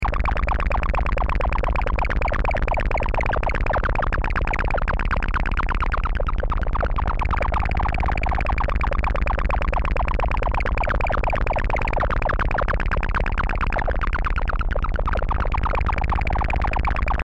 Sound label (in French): MS-20 gargouillis galactique; modulation complexe comme on voit sur Youtube avec du gros matériel; Démo audio des fiches didactiques de sons analogiques détaillées dans le livre